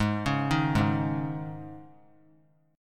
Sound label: G sharp Suspended 4th G sharp; G#sus4 arpeggiated; JGuitar